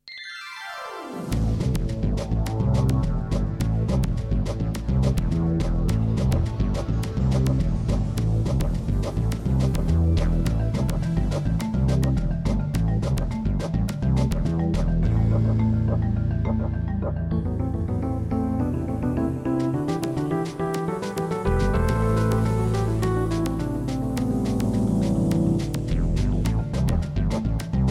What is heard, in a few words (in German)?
Industriefilm